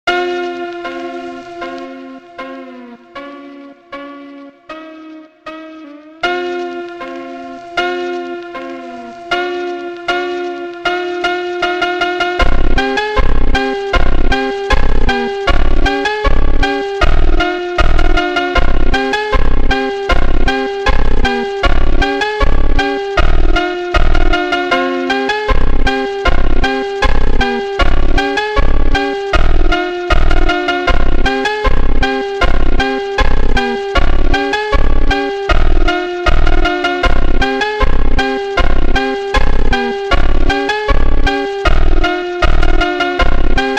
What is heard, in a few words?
funk super slowed; tiktok funk